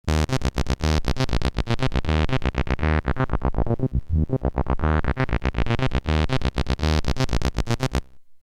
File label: HS303 saw